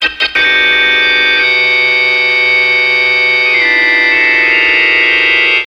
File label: organ